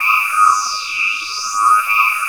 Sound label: 3100 E 3, Korg; Back In Time Records; Korg Universe VOL-1